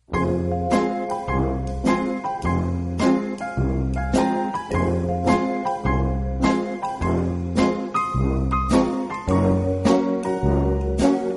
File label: Instrumental